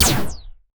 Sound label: short low pitch punchy la, laser